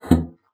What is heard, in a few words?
BASSOON; Xsample VOL-6